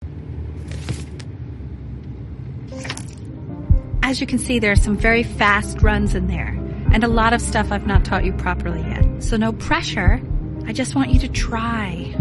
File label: heartbeat; before your eyes